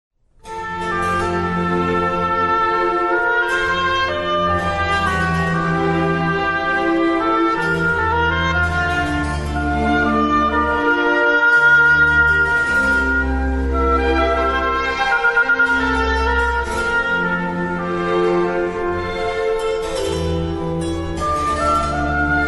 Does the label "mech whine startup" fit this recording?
No